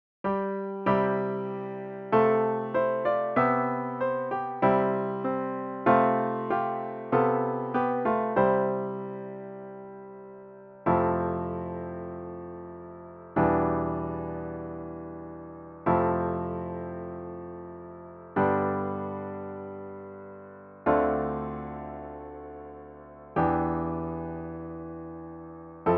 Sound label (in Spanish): piano; Cantata para SATB, Solistas y Narración